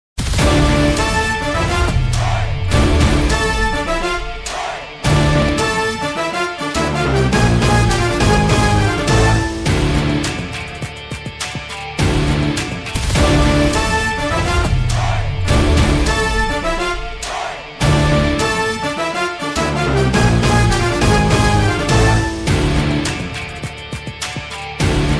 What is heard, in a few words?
bgm attitude bgm; dikkiloona